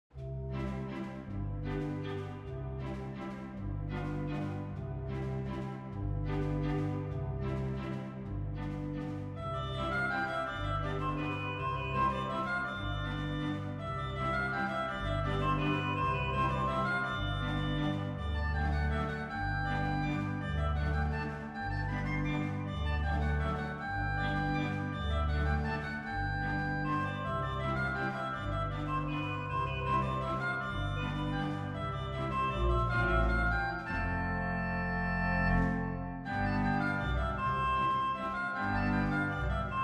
Piano Grade 1 Finger Exercises Ideas

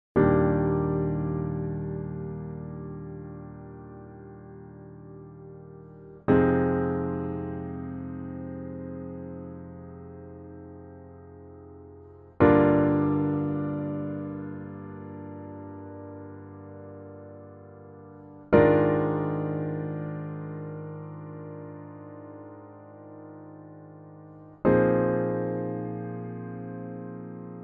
Sweet Spot NY Steinway Model D

